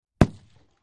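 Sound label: Hk21 Machine Gun Single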